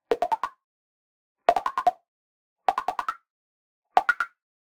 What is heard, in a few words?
Digital Click Positive; boom library; The Interface